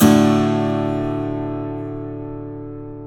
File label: Acoustic